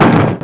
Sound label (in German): Rempler; Break